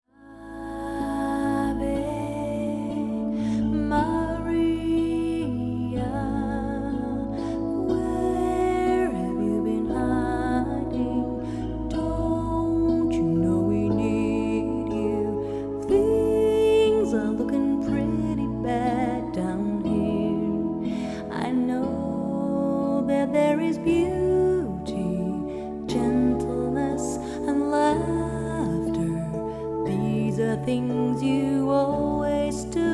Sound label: ave maria, duo